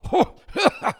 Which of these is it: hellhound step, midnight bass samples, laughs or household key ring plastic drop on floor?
laughs